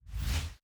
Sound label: digital whoosh